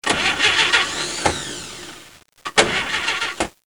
Démarrage voiture plus de courroie

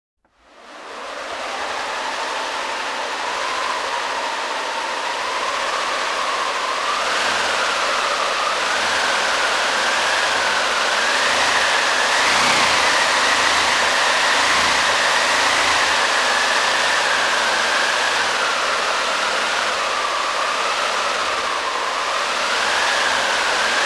Vent glacial; bruitage; Climat

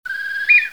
Oiso, Animaux; bruitage

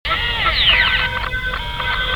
Décryptage

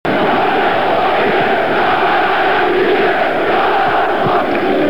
Manifestation; bruitage

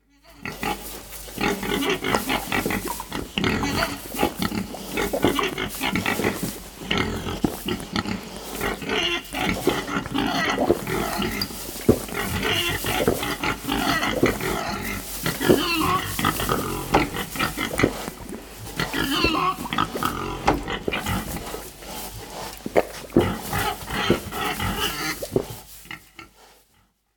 Le cochon mange, Animaux; bruitage

